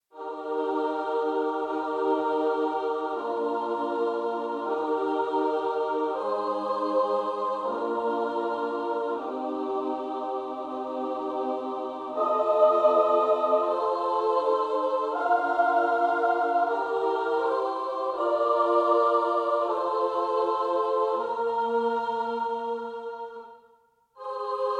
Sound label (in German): Nonnen Chor. Per coro femminile.; Nohr Friederich